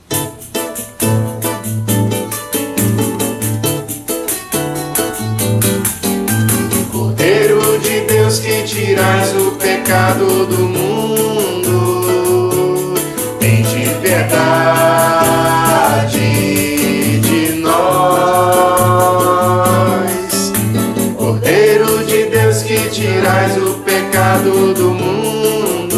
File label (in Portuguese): Cordeiro de Deus missa em samba